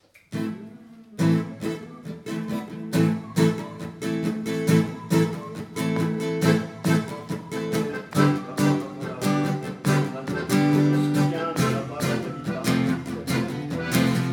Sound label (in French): plinn rythme guit